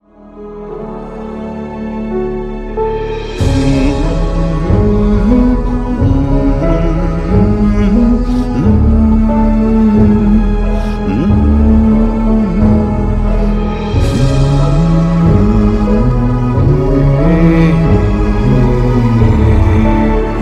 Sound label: Horror Movie Ringtone